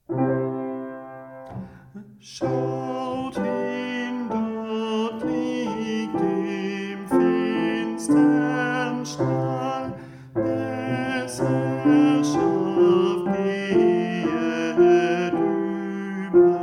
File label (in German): Sopran, bach choraele sopran